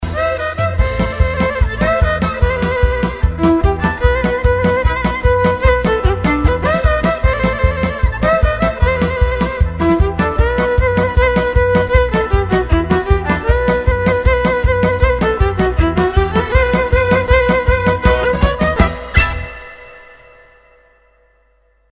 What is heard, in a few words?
jewish medley